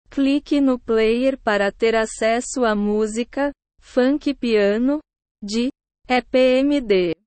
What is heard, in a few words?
funky piano